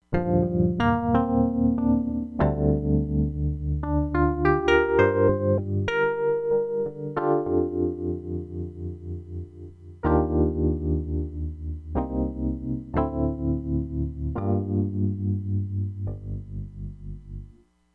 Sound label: ELECTRIC PIANO, Crumar Bit 99; Factory Programs